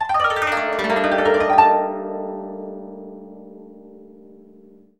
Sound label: KOTO ARP